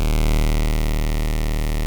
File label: OB D#2, VOX, Synthesizer; Hollywood Edge - Giorgio Moroder Rare Synthesizer Collection; OB 4 VOX.